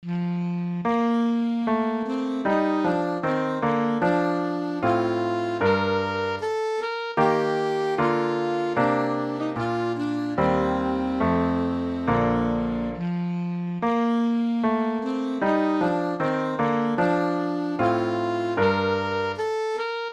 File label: the minstrel boy alto saxophone solo